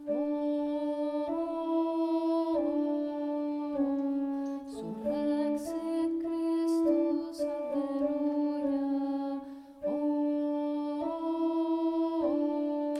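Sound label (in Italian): Surrexit Christus, contralti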